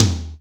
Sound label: Tom, Korg Wavestation